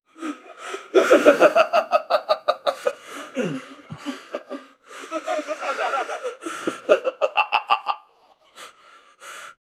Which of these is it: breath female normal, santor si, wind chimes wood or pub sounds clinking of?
pub sounds clinking of